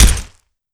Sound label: grenade bounce rock